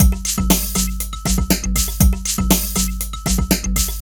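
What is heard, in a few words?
drum loops, right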